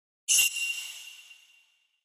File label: UI comform sound